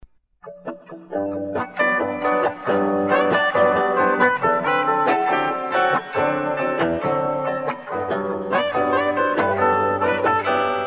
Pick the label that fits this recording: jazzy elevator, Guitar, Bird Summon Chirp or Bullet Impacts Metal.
jazzy elevator